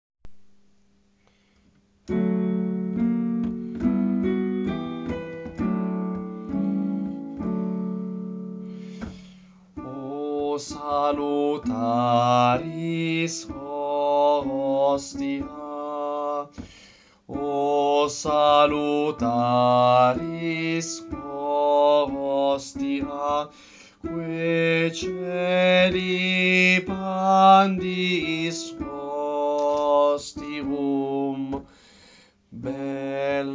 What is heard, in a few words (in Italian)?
contralti, coro; Coro Isola del Giglio